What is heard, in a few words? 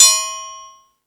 Percussion